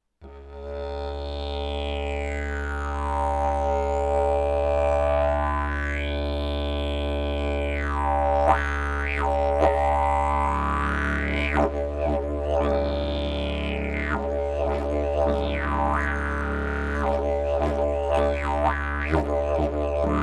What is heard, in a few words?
didgeridoo; javor lesni